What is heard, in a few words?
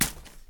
dumping